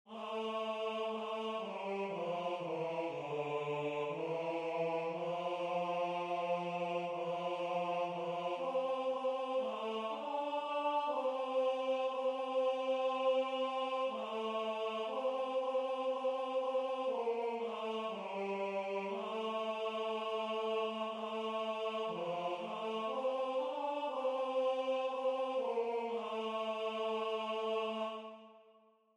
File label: Tenor